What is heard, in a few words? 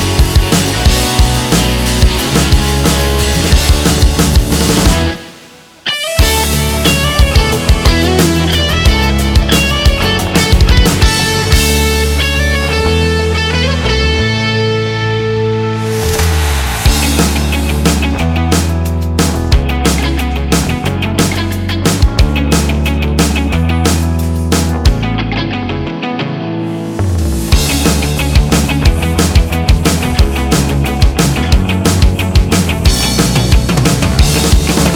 Instrumental